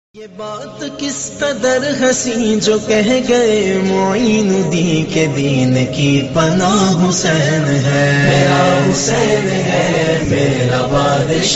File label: islamic naat ramzan ringtone